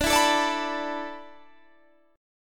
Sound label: D 6th Suspended 2nd D, chord